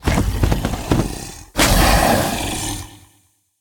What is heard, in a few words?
Cretaceous enraged